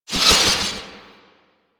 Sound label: Spear Gun Whizzby; Weapons; Warframe; Tenno; Hoplite